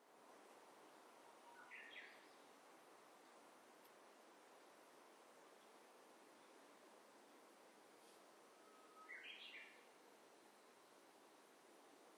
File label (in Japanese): uguisu